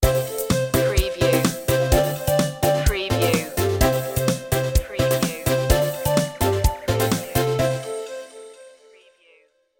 playtime sting

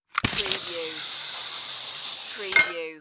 Grapple Gun Shoot

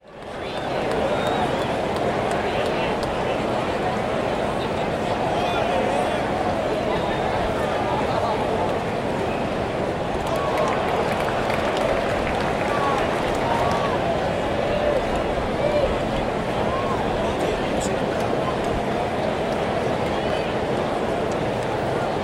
Baseball Crowd; Baseball sound effects. Baseball sports crowd sounds, baseball hitting sounds, running and; sound effects bible

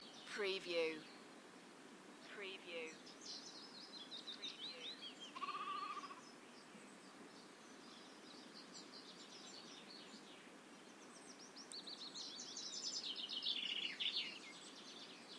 Nature Ambience Cym Bychan Wales Loop; beanstalk audio

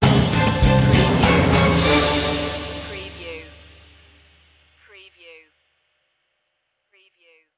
spooky stinger; media music now

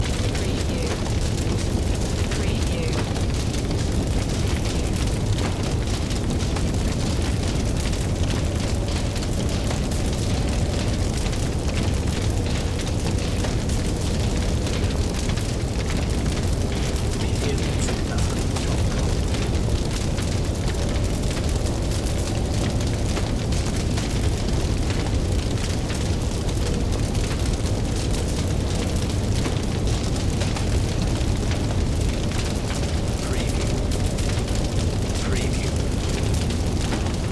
Fire Flames Heavy

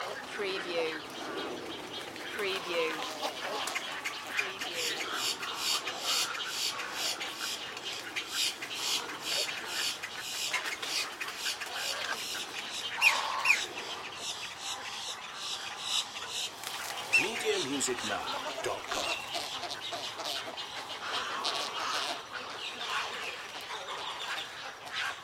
Marsh Ambience Florida Evening Loop

